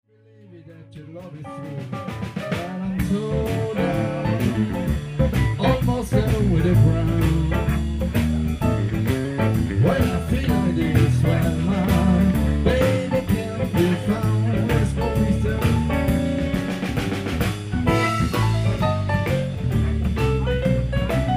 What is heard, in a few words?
Solo Piano